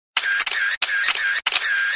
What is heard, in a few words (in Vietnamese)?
Tiếng máy chụp ảnh